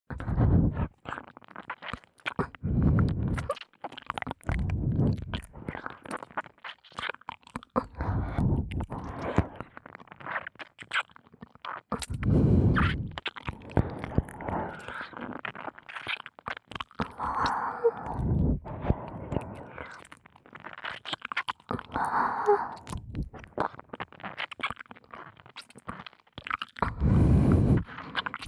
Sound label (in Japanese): (左)ねっとりしゃぶりつき耳舐めエッチ, KU100 くちゅ系効果音版